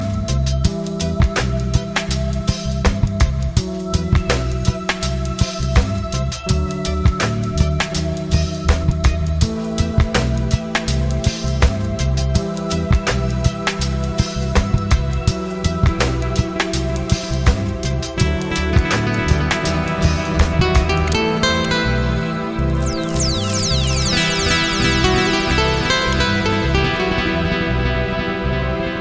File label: Nice Instrumental